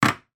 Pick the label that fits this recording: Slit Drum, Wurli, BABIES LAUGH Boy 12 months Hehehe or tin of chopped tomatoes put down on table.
tin of chopped tomatoes put down on table